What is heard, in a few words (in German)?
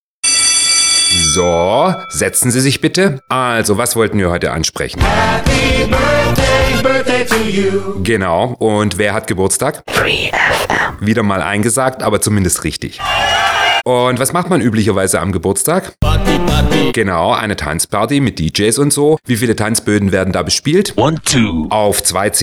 Jingle long; 15 Jahre Radio free FM